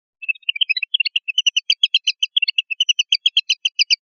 rep bailoteos, pardillo

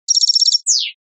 timbrada, campero

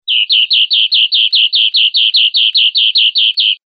chones corridos enrabietados, verderon

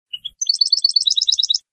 cascabeleo fino; campero

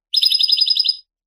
bibleos acascabelado, campero

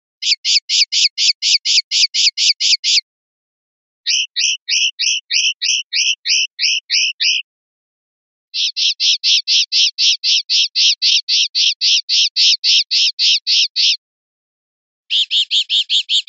rep rabieta varias, pardillo

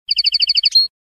currupin, campero

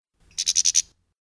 pedorreta; campero

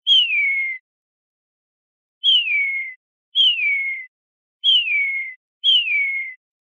golpes sueltos, verderon

